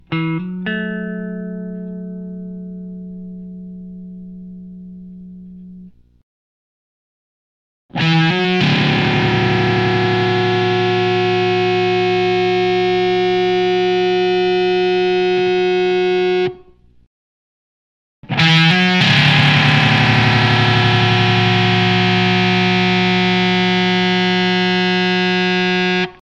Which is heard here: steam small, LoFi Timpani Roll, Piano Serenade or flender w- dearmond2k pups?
flender w- dearmond2k pups